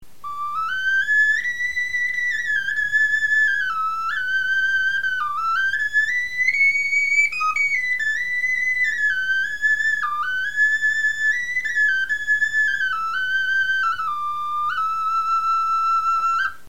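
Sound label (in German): pent, Knochenflöten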